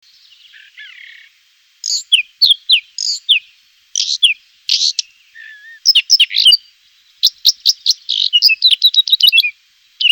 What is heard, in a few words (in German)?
Singvoegel Gartenrotschwanz